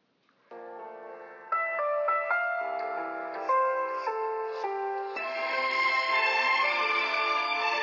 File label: ringtone